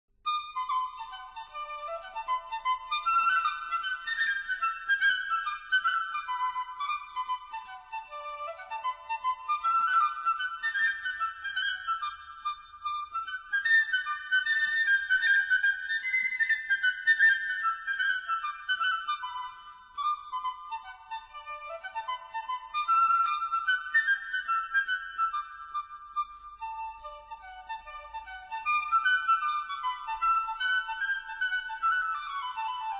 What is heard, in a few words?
Trad Irish Hornpipe, flute; Belfast Hornpipe